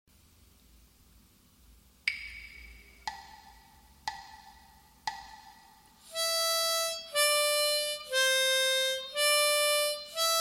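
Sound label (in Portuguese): gaita, exercícios de leitura à primeira vista; Gaita de Boca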